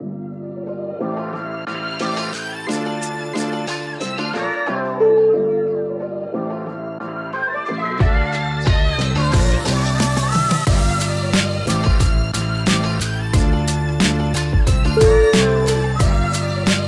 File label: feel this beat